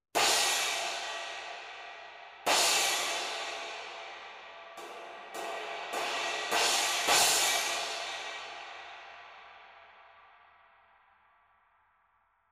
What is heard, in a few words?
MEINL